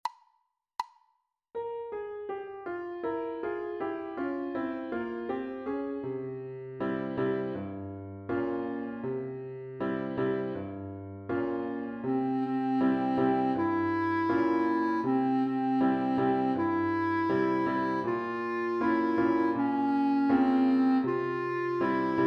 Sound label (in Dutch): Lets Sing met klarinet